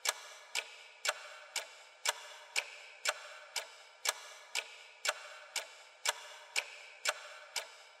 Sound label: Clock Second Hand Reverb Loop